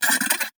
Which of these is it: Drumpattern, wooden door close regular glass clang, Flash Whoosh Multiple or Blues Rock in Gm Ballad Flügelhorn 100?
Flash Whoosh Multiple